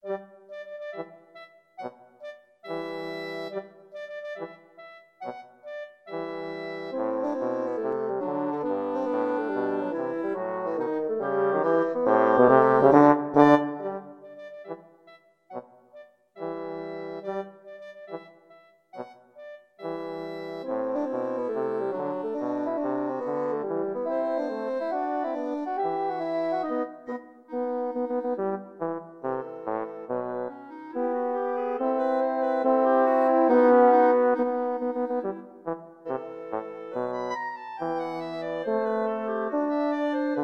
tchaikovsky nutcracker, clarinet horn bassoon

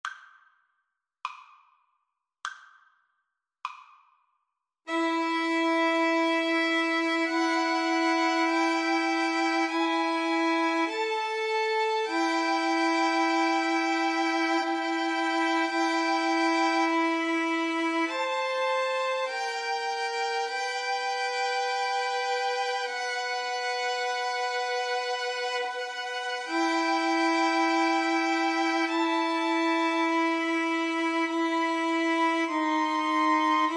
aequale VLN TRIO

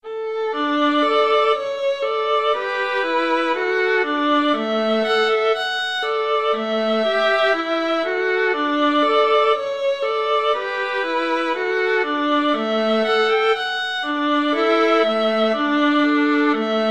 violin viola duet

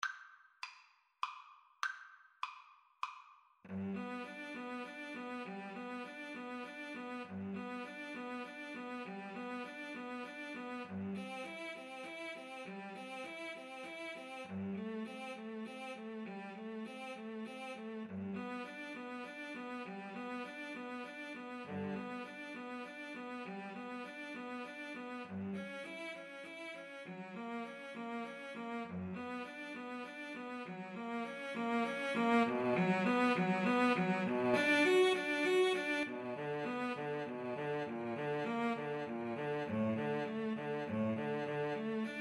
swan, Cello Duet; saint saens

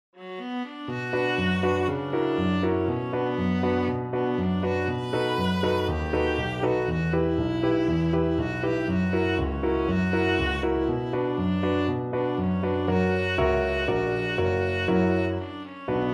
viola

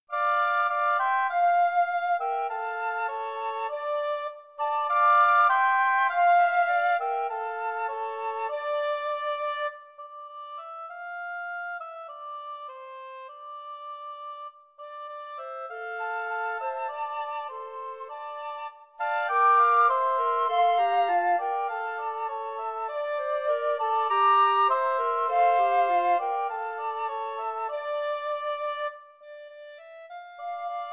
flute oboe clarinet

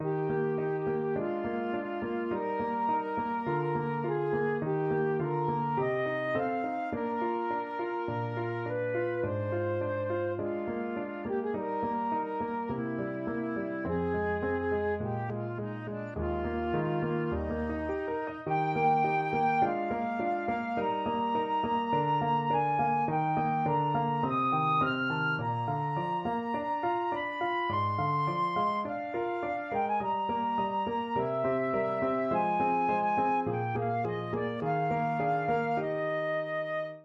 beethoven pathetique 2 flute

